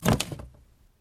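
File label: sounds where a person stumbles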